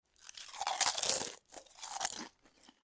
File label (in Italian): mangiando